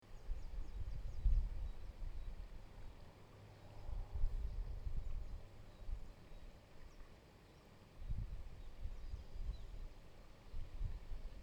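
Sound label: amb nature, Inde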